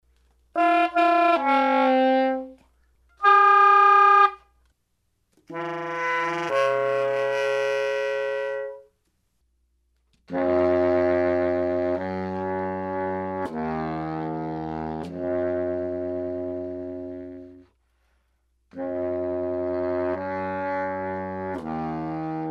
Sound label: multiphonics